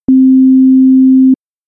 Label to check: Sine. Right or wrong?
Right